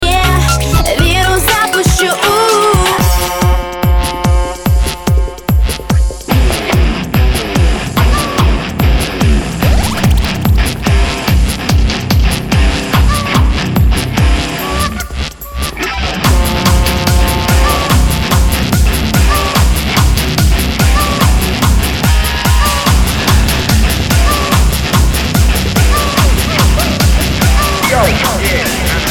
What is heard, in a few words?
ebm aerobic